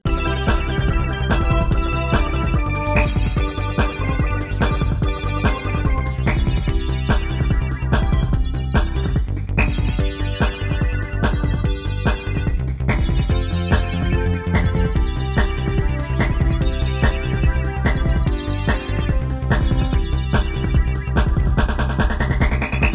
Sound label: Song (Instrumental); Spotlight 2